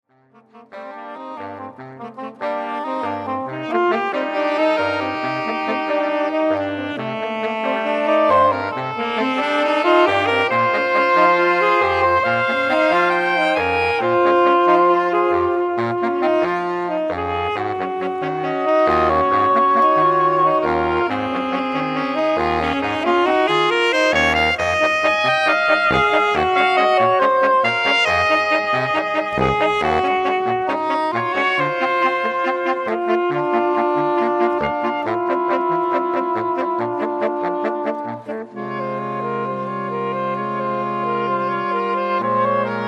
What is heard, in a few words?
Tango quartet